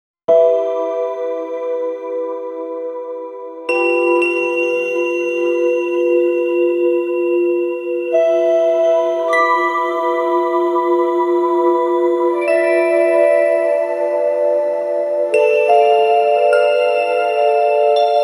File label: fantasy lo fi anime, chimes